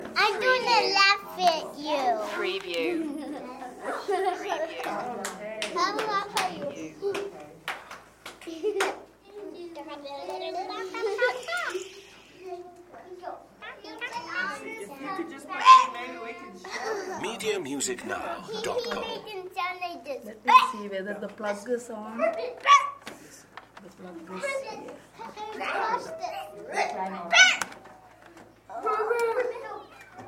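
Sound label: Preschool Ambience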